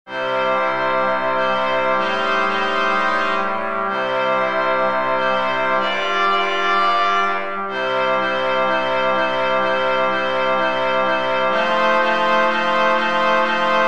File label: brass